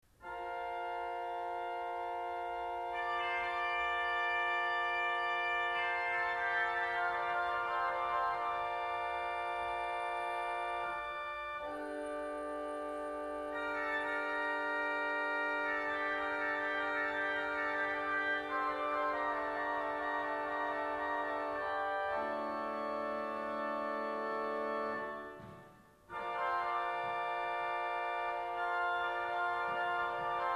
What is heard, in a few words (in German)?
Cornett